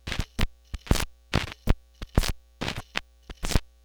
Record Noise Warped, Vinyl Record; Record Noises

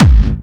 Bassdrums Clubby Kicks; Vengeance Samples

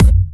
Bassdrums

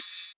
Ride, Cymbals; Vengeance Samples

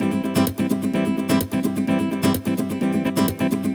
Nylon Guitar C maj, 128BPM; Vengeance Samples; VEH3 Nylon Guitar Kit 1

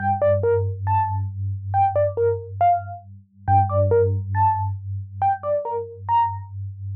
Softpluck DRY 138BPM, Gminor; Melody & Synth

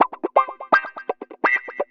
125 c, guitar licks; house; Vocal House; PS-28 Vocal House Samples; instrumentloops

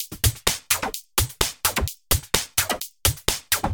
Minimal Loops

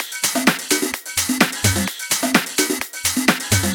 Latin House Loop 128 BPM; Vengeance Samples

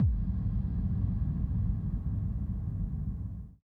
132BPM Kick FX, G# major; Sound FX

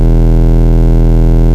Root E, Bass

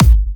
Bassdrums Clubhouse; Vengeance Samples; VEC3 Bassdrums; Clubhouse Kicks

